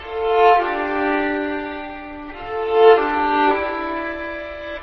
strings, Sad Loops

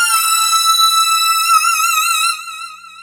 oxlead, synths; Notes

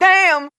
"damn" SUNG, expletives; god damn

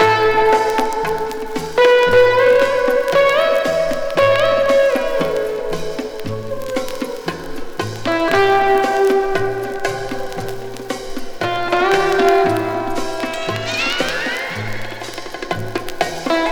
guitar, Loops, Phonky

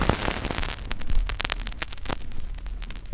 needle drop, Vinyl; Misc Percussion

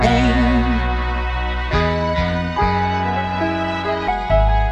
horns, phonky loops

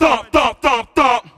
thump thump thump thump; ooh ahh

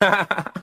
laugh

